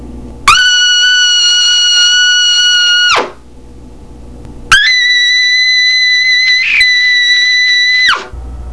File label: trumpet